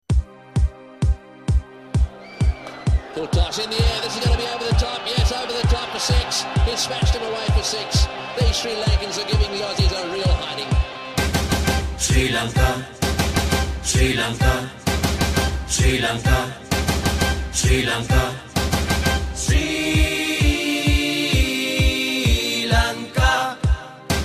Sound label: Cricket Song